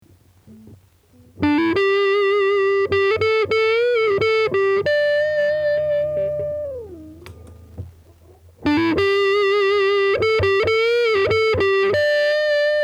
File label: sus; tc electronic